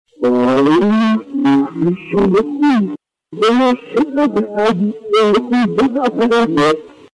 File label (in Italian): dare rutto